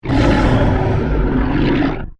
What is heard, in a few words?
Monster Growl, Horror, Monster, Growl, Creature; Monsters